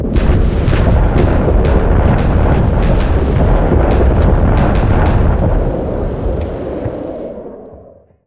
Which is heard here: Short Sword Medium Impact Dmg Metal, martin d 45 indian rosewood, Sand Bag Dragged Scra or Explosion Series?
Explosion Series